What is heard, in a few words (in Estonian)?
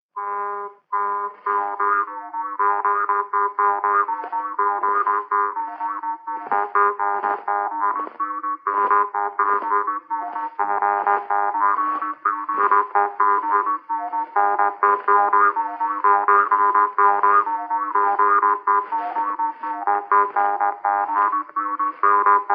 Isa polka, Kirbla, parmupill, folk